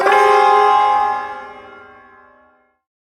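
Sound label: Shawzin Minor Chord; Min Chord K; Warframe; Duviri Ersatz Shawzin; Chords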